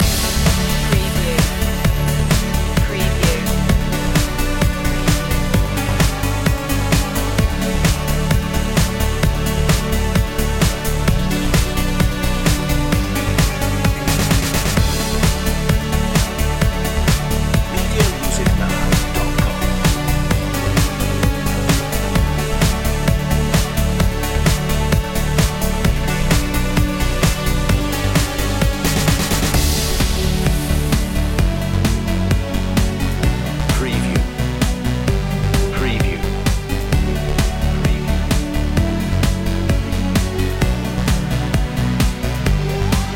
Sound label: glider loop